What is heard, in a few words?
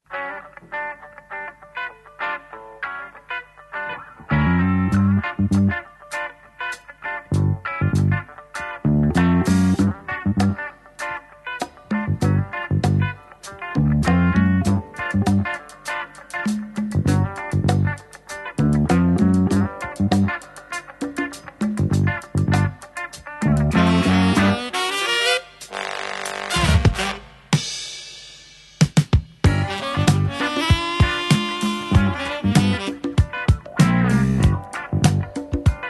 West Coast Horn